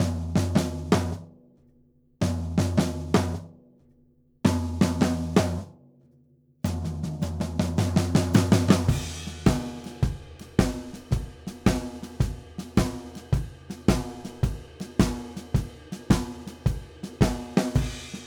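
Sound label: Blues Drums Breakdown; Digidesign; Pro Tools Essential Loops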